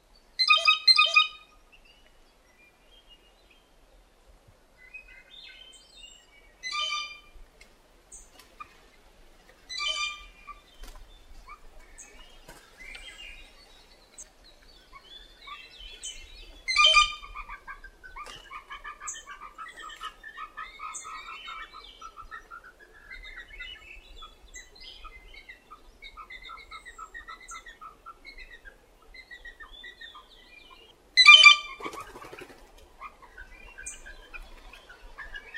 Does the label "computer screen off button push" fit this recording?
No